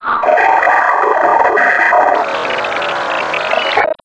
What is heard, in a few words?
zombine idle; scmod